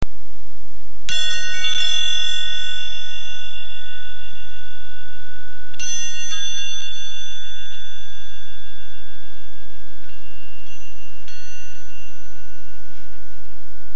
tempel bell

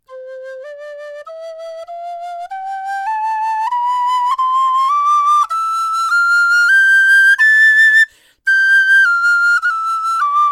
blockfloeten sopran tonleiter; schneider

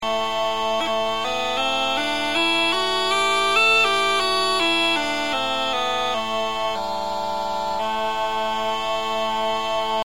weepipe tonleiter

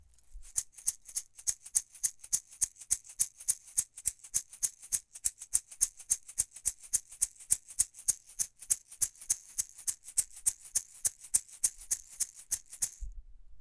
mini shaker fein

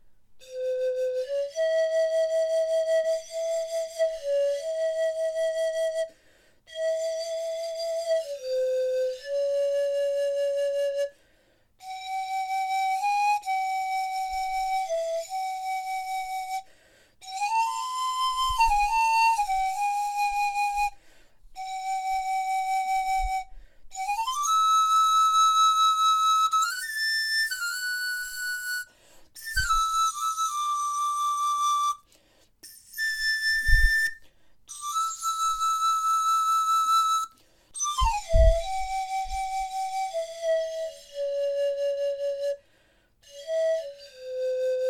indio panfloeten gross

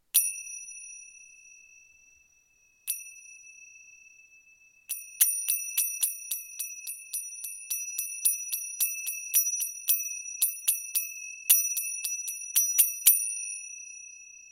zimbeln klein

